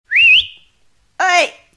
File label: Siul Oi Notification